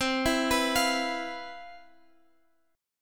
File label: C Major 11th C, Guitar Open F; CM11 arpeggiated; JGuitar